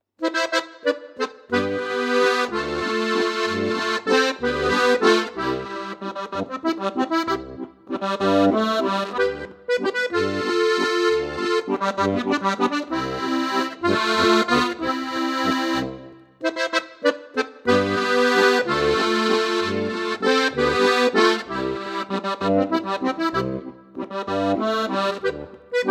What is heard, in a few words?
schlapfen walzer